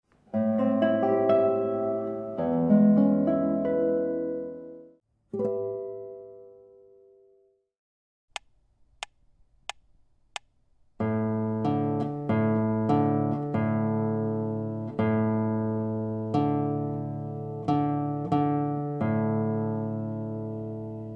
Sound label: Walking Bass